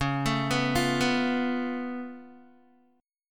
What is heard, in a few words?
Db Augmented 7th Db down Guitar; arpeggiated; JGuitar